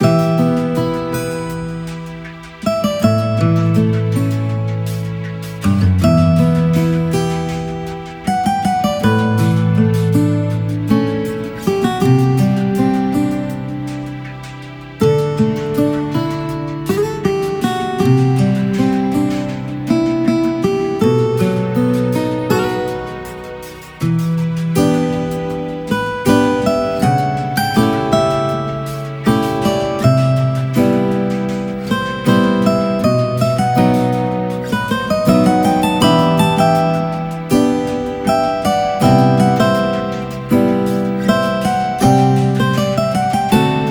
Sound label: BGM sad; Armed Emeth